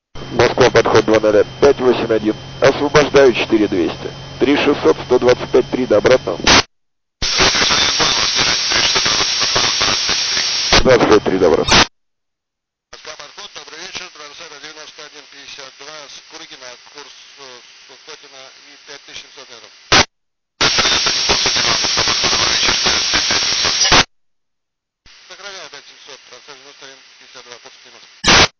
134 Hz FM

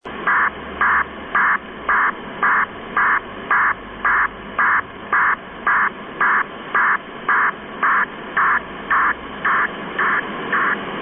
fsk 16005

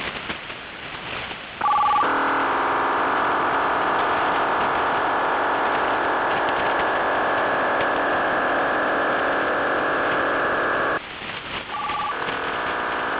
5714 khz ofdm 30; f-5714 kHz OFDM-30 Br-40 Hz Sh-50 Hz

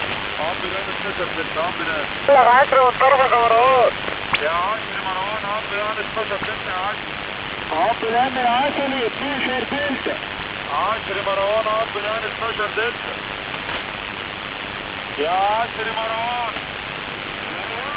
5566khz usb

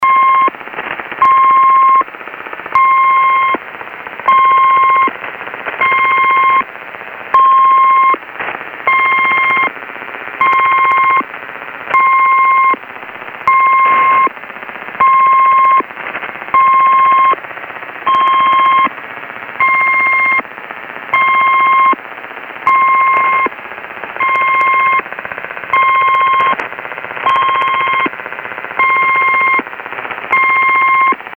4182 khz t marker

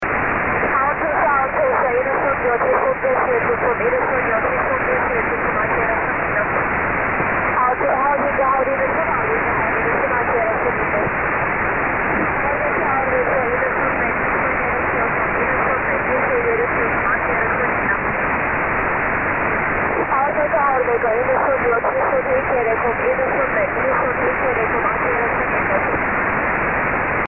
4670khz unknown

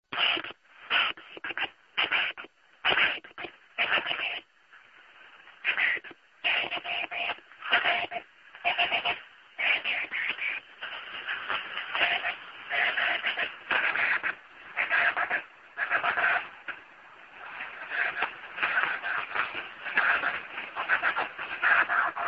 signal

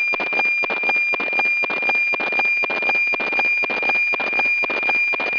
NFM

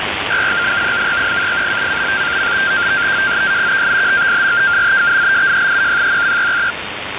usb fsk 2 170; 131265khz

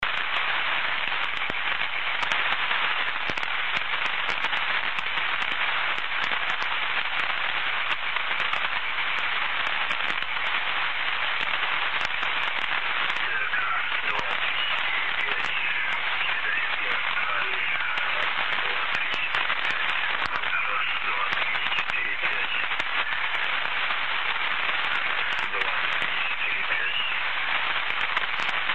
usb

